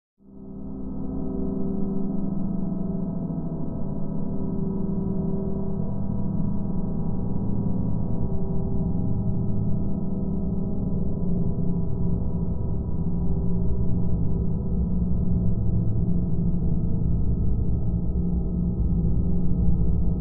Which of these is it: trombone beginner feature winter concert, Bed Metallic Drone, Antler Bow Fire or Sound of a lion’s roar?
Bed Metallic Drone